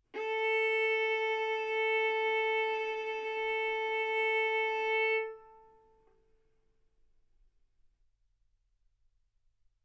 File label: Violin arco mf sul A A4 B4 stereo